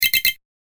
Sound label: Pick Up Tokens Game Sound; Video Game Sound Effects; electronic sound effect